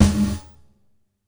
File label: Gated Snare; Univerb; creative gate; Gated Reverb